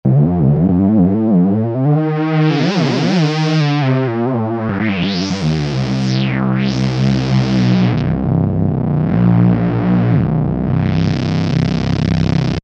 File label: Hard Dual Saw; V-Synth